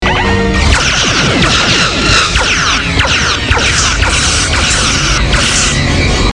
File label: fire all weapons